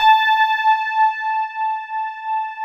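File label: GLASS Rhodes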